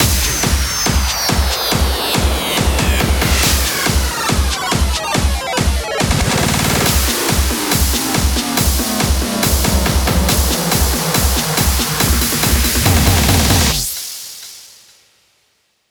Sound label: 140BPM 8Bars, HandsUp & Trance Fills; Humiliation; Vengeance Samples